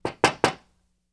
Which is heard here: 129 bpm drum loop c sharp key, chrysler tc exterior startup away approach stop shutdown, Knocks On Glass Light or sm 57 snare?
Knocks On Glass Light